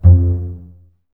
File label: BSS F 2 Pizz, String Ensembles Basses; Miroslav Vitous